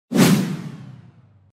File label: woosh